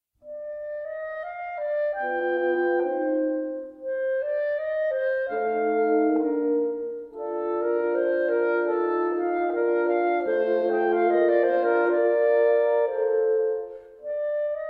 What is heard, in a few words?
Quintet; in D Minor; Mvmt II